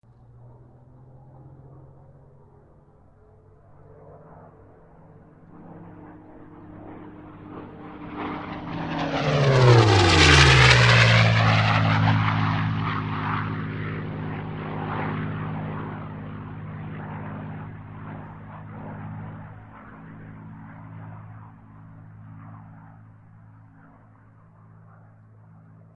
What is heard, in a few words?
P-51 flyby